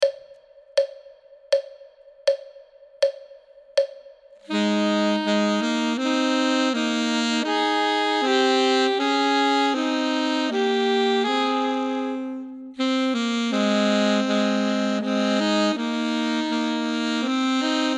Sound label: duet; Ten Easy Duets